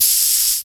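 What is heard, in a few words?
INHALE open hi-hat; Drums In Your FACE!; BONUS HATS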